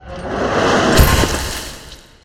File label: Meat Slice Heavy; Five Nights at Ronald's